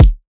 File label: Riddim Kick